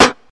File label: rock snare sound b key